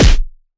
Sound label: Anime Super Punch